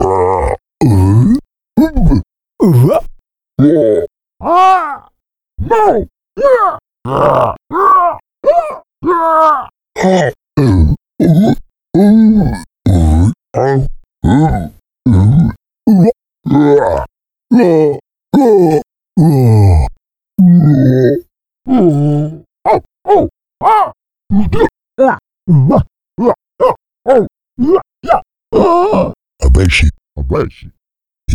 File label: enemy dead all mix